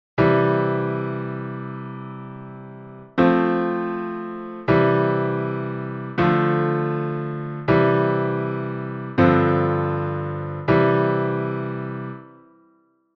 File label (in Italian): Armonia brilla brilla mia stellina, basso elettrico; teoria musicale